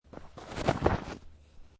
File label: Snow And Ice Snow Movement; Weather